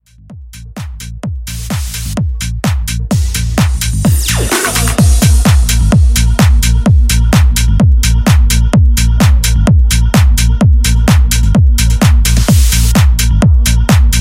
Progressive House Intro; Remixfreakz

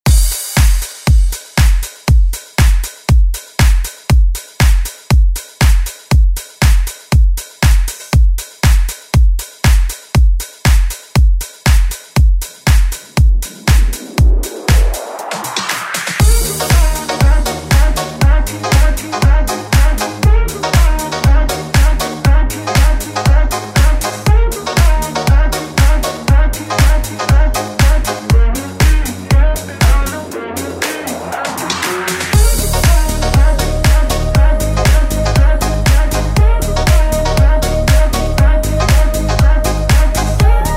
Dance Bootleg; Deejay Hit; Redrum

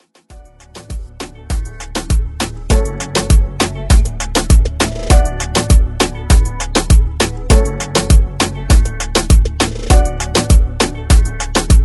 Moombah Mixshow

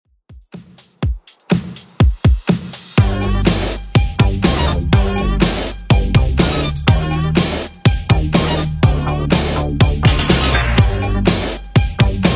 Cold Hearted 80s Redrum 123bpm

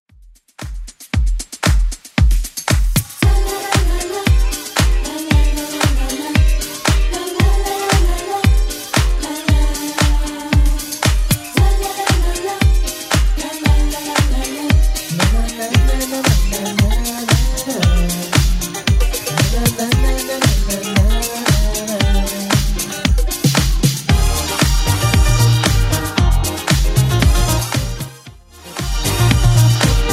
80s Club Edit 115bpm

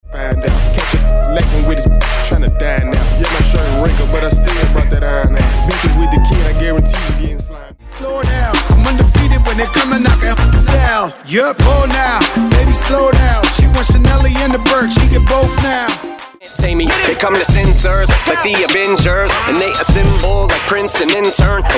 Hip Hop And RNB Megamix

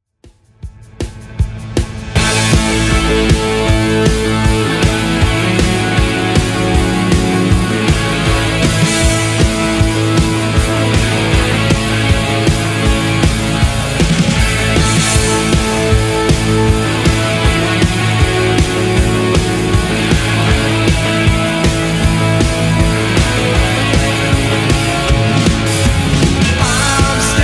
80s Redrum, 157 bpm

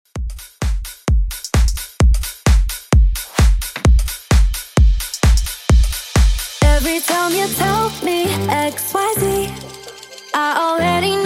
In Outro Club 130Bpm

